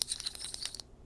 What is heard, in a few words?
Cable Coiler; bb - Smol Mechanisms